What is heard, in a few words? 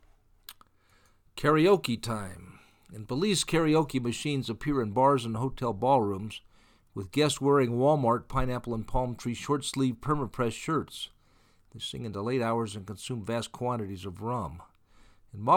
karaoke time